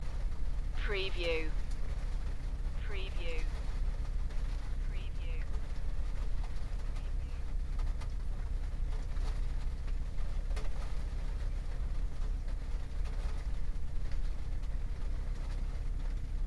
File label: Rain with diesel tick over loop; beanstalk audio